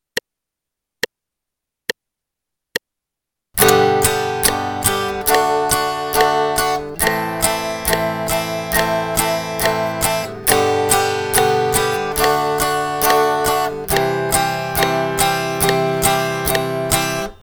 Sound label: 8th note strumming, guitar